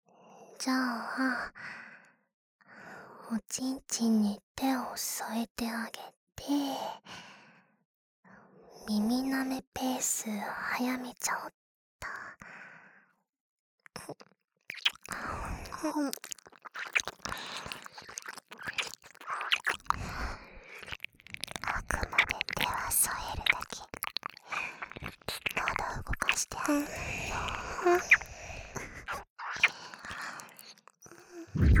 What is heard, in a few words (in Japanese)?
耳舐めちゅこちゅこ, ASMR; さらにペースアップ; いっぱい出そうね♪